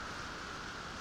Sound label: Background Noise